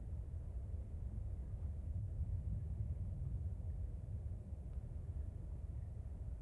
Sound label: Aquarium; blue archive